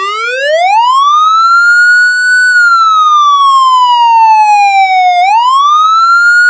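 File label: wail, siren; vcmod; els; sa-430m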